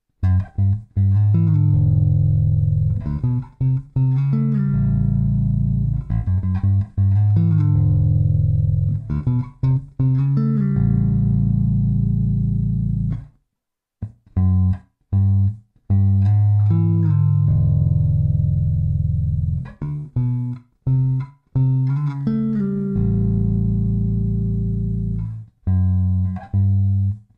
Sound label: Doublestops